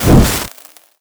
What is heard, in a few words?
candle; hamster race